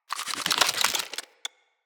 Root Spider Mother Foley Spin

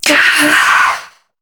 Struggle Short; New War

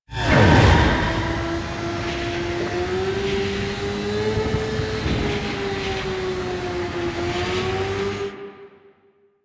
convoy close up; Rusalka; Warframe; NineteenNinetyNine; Stage8ShitboxConvoy

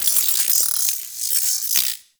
Venus Robo Fish Small Caught; Warframe

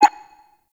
Interior Orbital Station Console Rollover; Warframe; Tau

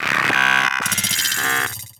Amalgam Moa Vocal Idle

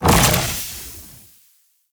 Grounded Hammer End; Unairu; Focus; Ultimate Abilities

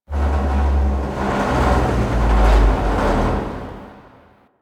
Destruction Texture; Warframe; Corpus; Railjack

